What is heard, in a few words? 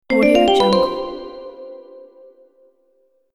bell like notification